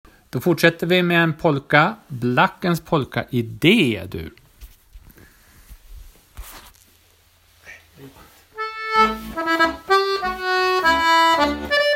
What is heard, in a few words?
Blackens polka